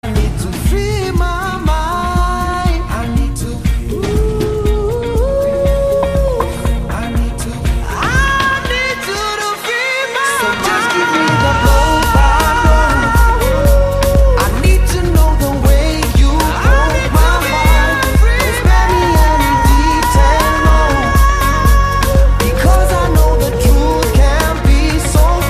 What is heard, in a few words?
south african ringtone